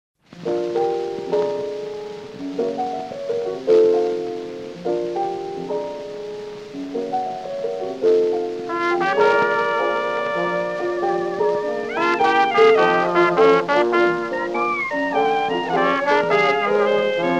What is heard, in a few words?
blues